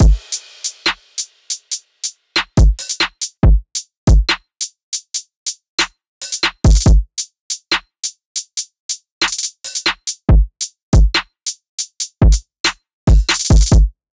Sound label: Beefy Drums